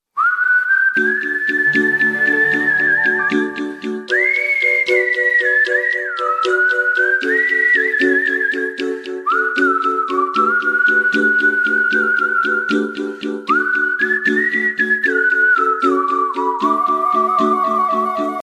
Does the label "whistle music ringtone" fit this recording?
Yes